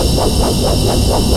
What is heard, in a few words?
TECHNO FX, JV-1080; Syntec; Wall of Sounds